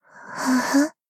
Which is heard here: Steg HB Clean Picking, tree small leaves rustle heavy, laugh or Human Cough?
laugh